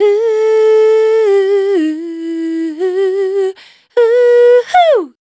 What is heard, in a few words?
FEMALE, Dance Vocals